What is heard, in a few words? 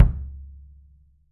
WOOD KICK, 3D Drums